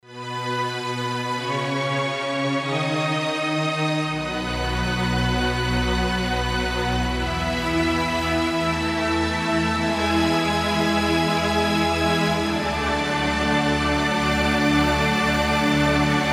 String Mass; Generalmusic Equinox

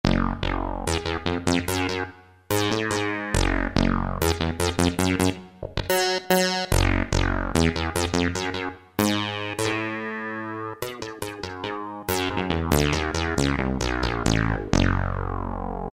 SEM PULSED, Kurzweil K2000; Kurzweil K2000 Contemporary ROM; Contemporary ROM Programs and Demos diskette; ANASYNTH

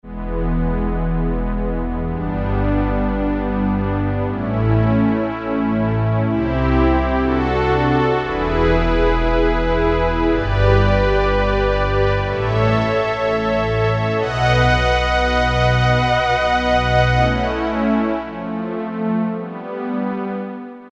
Analog pad, Korg T3

